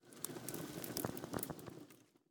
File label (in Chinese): 宝库环境声; ambient